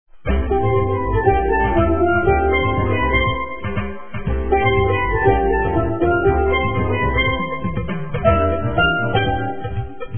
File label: Steel Drum; Steel Drum Band